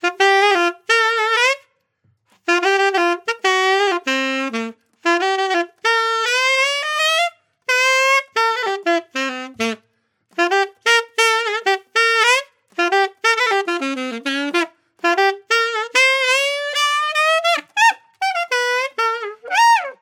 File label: Jupiter JAS700Q Alto Sax